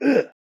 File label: man injured grunt; soundscrate